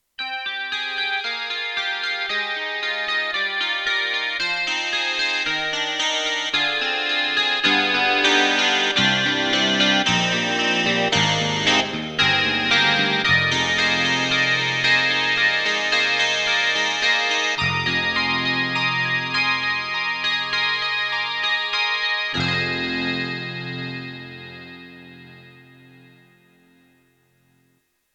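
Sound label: YAMAHA MU1000EX Guitar Clavi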